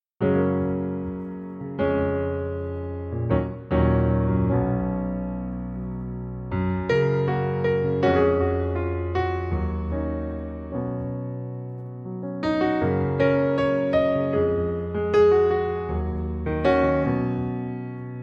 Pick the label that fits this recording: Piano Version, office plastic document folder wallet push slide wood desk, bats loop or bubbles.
Piano Version